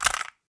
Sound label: Cassette Rattle; Robobeat